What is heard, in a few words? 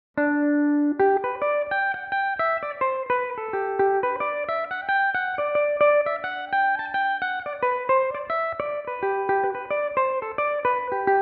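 G major belmuse, irish mandolin; Paddy Whack; Jig (6/8); Belmuse EM-190 electric mandolin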